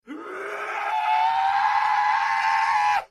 zoombie

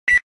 qr code scan beep

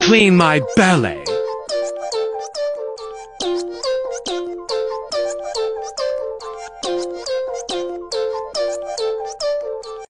dog wash; clean my bellay; pibble

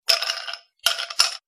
ice break

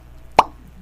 pop

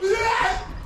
screams

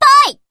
minor damage; yuudachi kai2

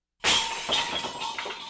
vase break

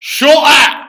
scottish shush

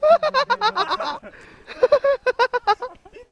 hahahahaha

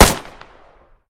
pistol sound effect

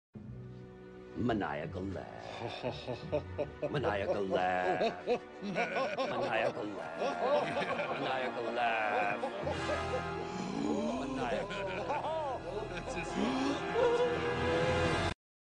maniacal laugh; the muppets

